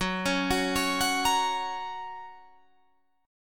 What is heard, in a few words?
F sharp Major, Guitar, Drop D